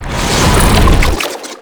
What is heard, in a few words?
Octopede Lash Limbs Whoosh; Warframe; ManInTheWall